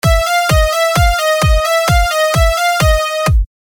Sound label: piano flop